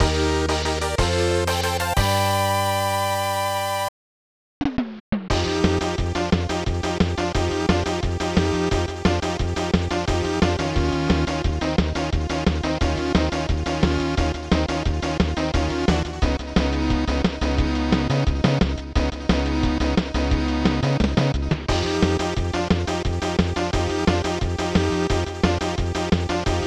Congratulations!; Ohmono Black Bass Fishing: Jinzouko-hen; Mark Davis The Fishing Master; SNES

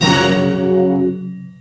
Jingle Power Down; rayman 3 hoodlum havoc gc ps2 windows xbox 2003

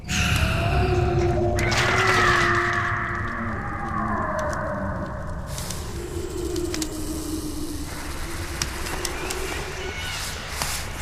Ambient, The Deadlands

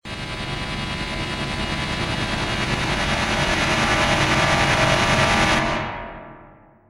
Game Over Build Up; Night 6; Five Nights at Treasure Island 2020 Remake; windows

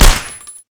UZI; blockade classic

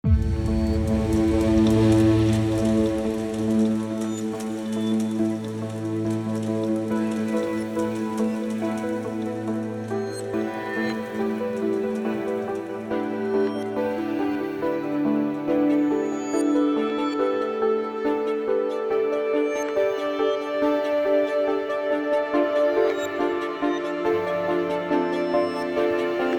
Lobby; Shattered Connexion

